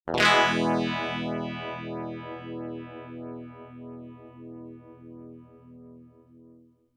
strum, full depth regen at zero rate at noon 2 stages